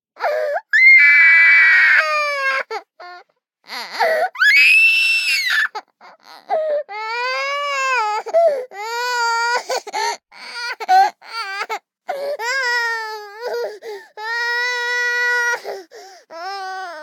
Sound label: BABIES CRY Girl 24 months Heavy; Baby Boom